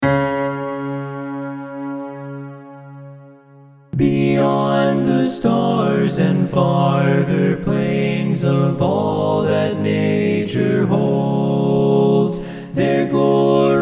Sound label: TENOR